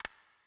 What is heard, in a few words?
Count Down